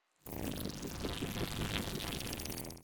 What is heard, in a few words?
Boss Transition